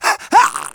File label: choke, survivor voice